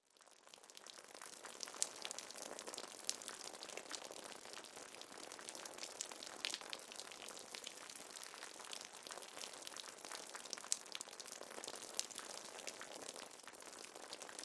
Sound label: Water Drips Splats, splashes